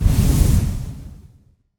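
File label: Rocket launch; Hovl Studio; SFX Battle sounds; WhiteMan Unity2D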